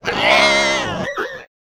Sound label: Faerie Dragon Death